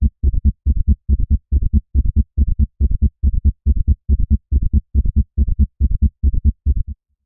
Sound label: create a detailed sound effect, medical